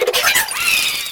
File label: Fan Chant; Mario Paint